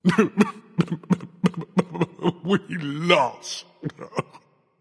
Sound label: boo hoo; smite